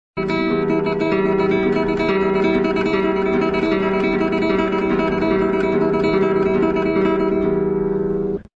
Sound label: Tremolo